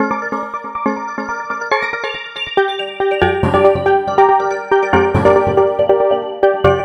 Synth Arp; Trip-Hop

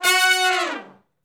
Hi Short Falloff (F#), horn section; Sections

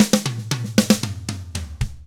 116 ROCK, Double Platinum Drums; ILIO

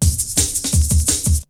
BREAKBEATS, TECHNO

